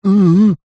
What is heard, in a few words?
human male middle aged rude are you thick type grunt